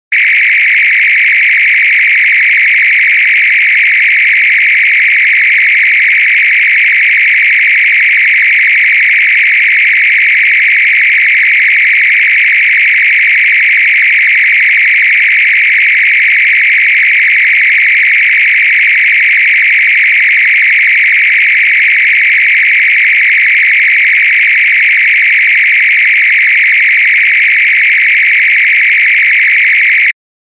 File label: phaser